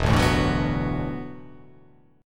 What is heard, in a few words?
E 9th Suspended 4th E down